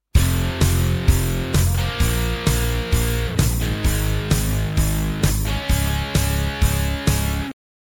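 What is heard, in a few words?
power chords, Add some Drums and Bass; Power Chord Lesson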